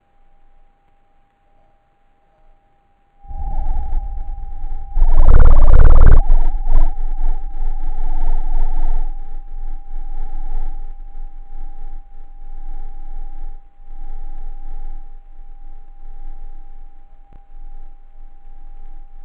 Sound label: Colombia m74 VCO, seismometer; diy home seismometer station